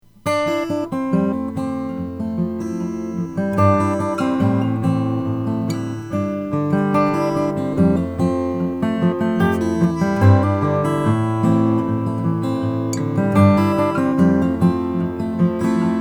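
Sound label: Breedlove C15e acoustic guitar; custom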